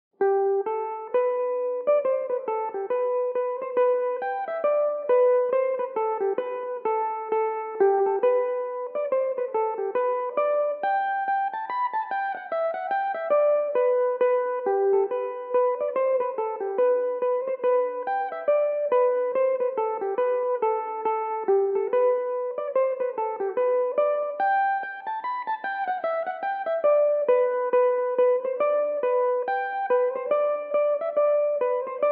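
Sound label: G major, Irish mandolin; The White Cockade; Belmuse EM-190 electric mandolin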